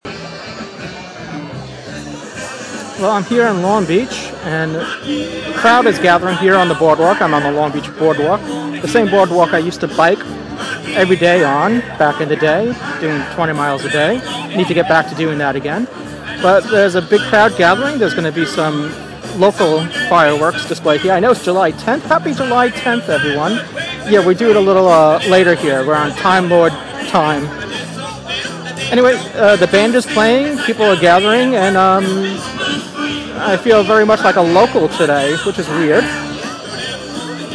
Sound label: live music playing on the long beach boardwalk